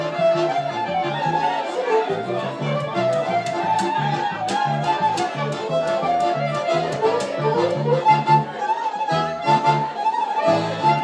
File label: klezmer band at an old friends wedding